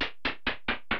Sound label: stairs down end; zsdx